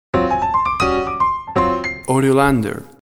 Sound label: piano jazz logo